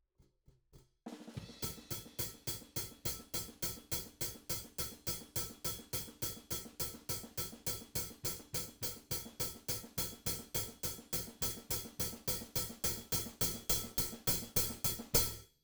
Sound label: Hat